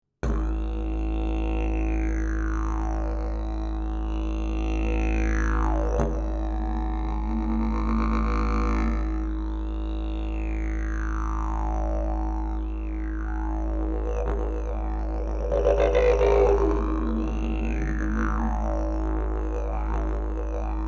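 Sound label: key a, didgeridoo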